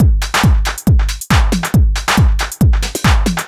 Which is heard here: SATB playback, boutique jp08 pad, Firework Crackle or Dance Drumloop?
Dance Drumloop